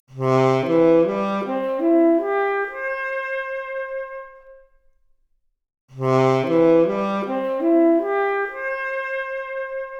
Saxophone arp, Stereo output noised; 4bit

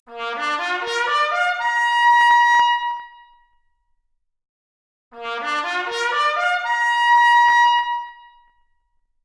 Trumpet arp, Stereo output noised; 4bit

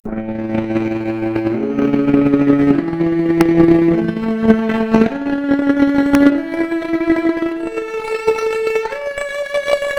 Violoncello arp, mp Stereo output noised, 3bit

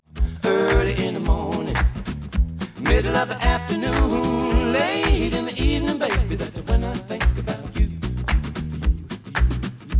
Stereo output noised; 3bit

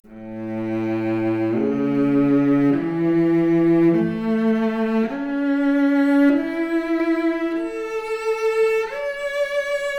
Violoncello arp, Stereo output noised; 3bit

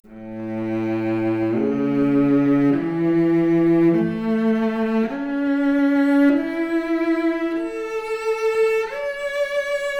Violoncello arp, Stereo output noised; 3bit

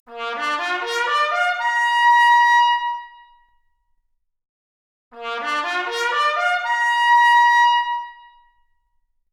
Trumpet arp, Stereo output noised; 4bit

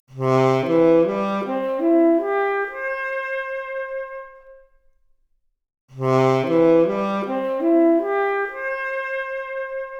Saxophone arp, mp Stereo output, 3bit

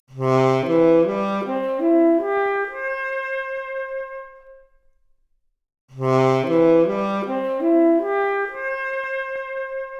Saxophone arp, Stereo output noised; 3bit

